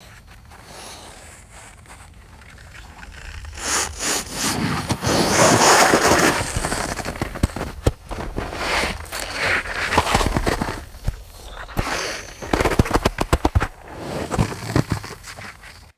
fast kayak sliding and bouncing on snow

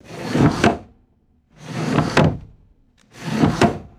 wooden drawer sliding open and closed

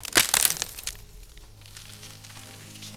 wooden tree branch cracking and breaking; vegetation

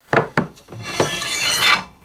wooden object sliding on floor

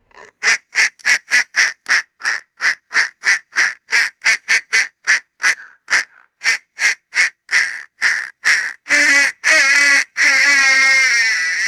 raspy toy duck call honks

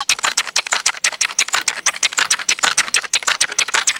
plastic water gun trigger pumped repeatedly; toys

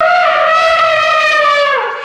high pitched elephant trumpet call; animals

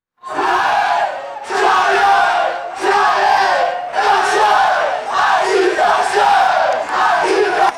crowd shouting hip hip hooray indoors

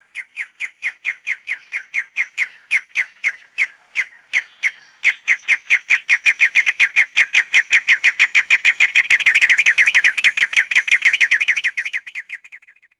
rhythmic clicking korhaan bird calls; birds

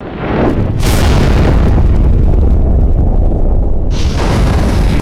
sudden fiery explosion whoosh and rumble

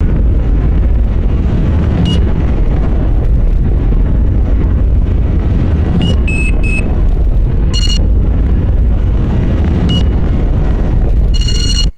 distorted drone with repeating digital beeps

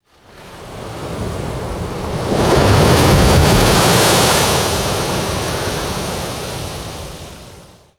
heavy ocean wave crashing on rocks; water

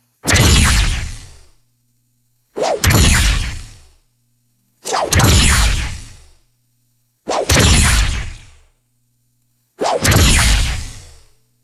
short sci fi energy weapon blasts; lasers

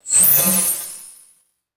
minimalist synth ui swipe sound

